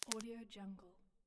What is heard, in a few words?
bubble wrap pop; stormwaveaudio